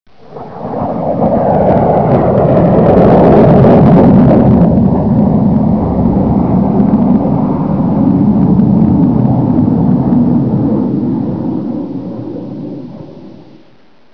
muffle

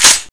ump45 bolt release, weapons

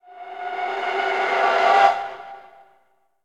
spook

